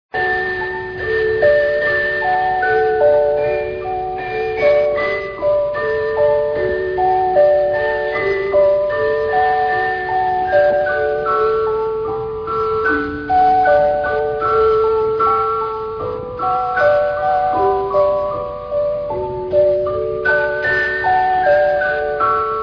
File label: interval signal; radio australia melbourne